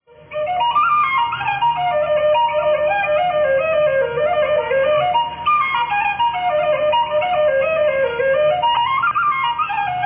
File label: enchanted wood; blackgangchine